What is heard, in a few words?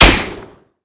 bullet hit; Valorant; fade wolf